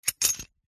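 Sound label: household bolt metal lock or unlock held in hand; zapsplat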